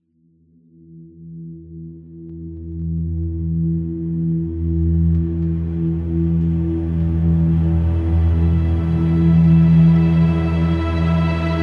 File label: drone